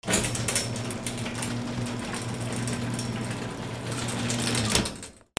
GARAGE

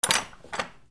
DOORS

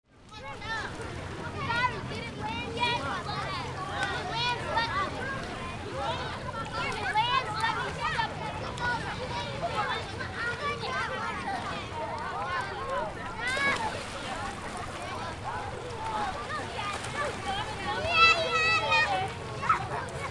AMBIENCE

